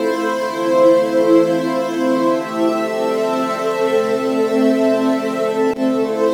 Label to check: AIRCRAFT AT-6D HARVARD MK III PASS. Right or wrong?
Wrong